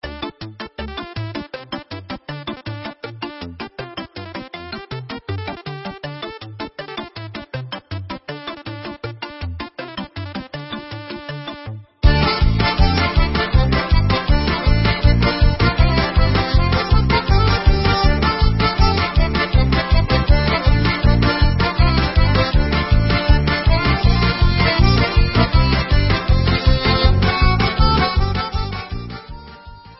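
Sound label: Hora Instrumental, Israeli Dances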